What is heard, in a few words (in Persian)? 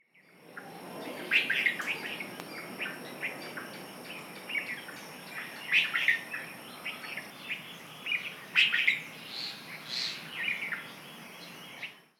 sedaye bolbol